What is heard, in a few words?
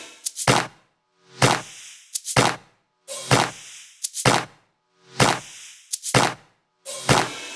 127BPM Kit; Vengeance Samples; Com Pop Kit